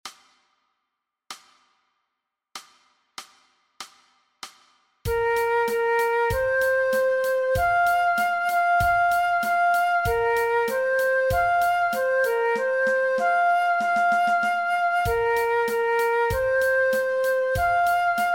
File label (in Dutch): MEESPEELTRACK Fluit alternate; Dwarsfluit aangepast; Nij Talint Orkest